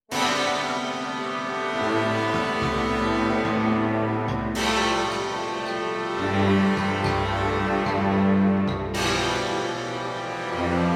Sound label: Forest Fantasia underscore ORCH